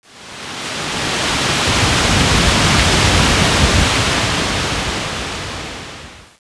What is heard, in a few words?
sandstorm, scorpion; npc